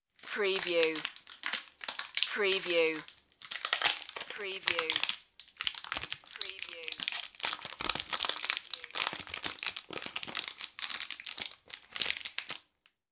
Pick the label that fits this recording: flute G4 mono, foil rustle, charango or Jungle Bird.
foil rustle